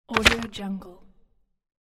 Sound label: page turn